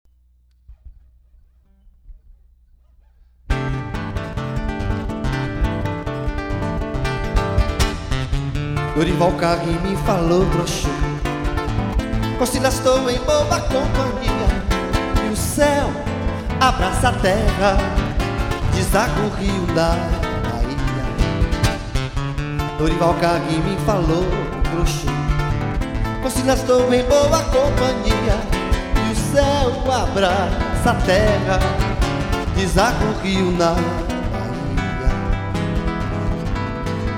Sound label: Brazilian Jazz Samba